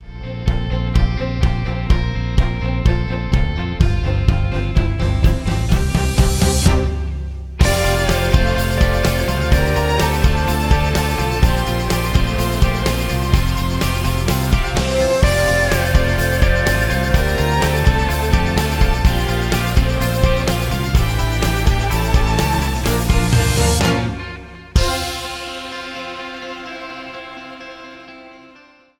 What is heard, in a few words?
Accompaniment